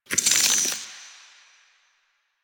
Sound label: Cave Hi Tech Clicks; Ambience; Warframe; Stalker Cave